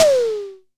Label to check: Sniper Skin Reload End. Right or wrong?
Wrong